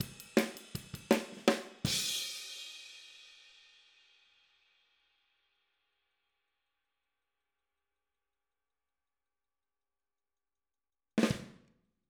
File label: Outro Hi Hat, 162 BPM, Drums; Drums from the Big Room The Mixes; Take Your Toms Off